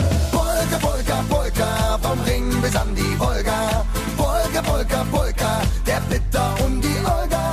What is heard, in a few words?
Polka Polka